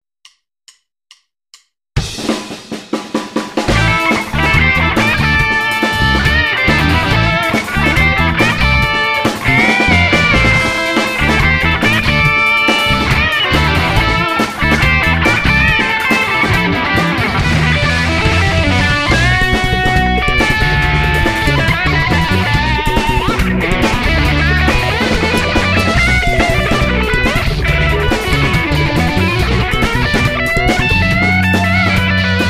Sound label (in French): funk & funk-rock, PRS Cu22; guitare; Manudifunko